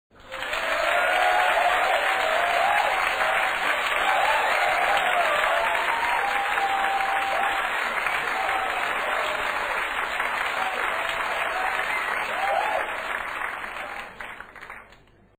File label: applause